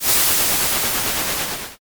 grill

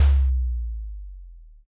odaiko

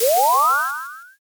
firework launch

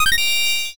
notify superchat

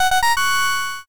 short fanfare

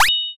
surprise

